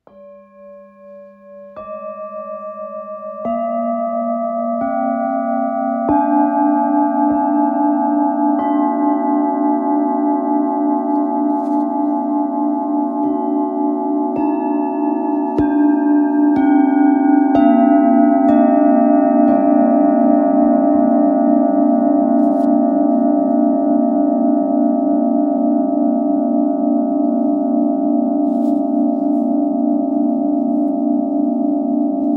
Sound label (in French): Set 7 bols full moon rose